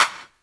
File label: CLAP, Dance Drums